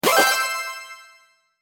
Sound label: coin sound